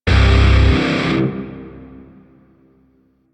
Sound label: Shawzin Chromatic Chord; Grineer Shawzin; Warframe